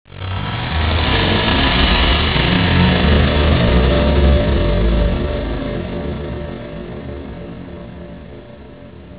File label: drone; slo-mo tearing